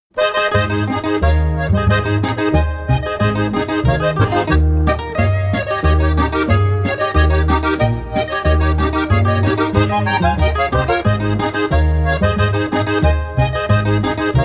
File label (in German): Harmonika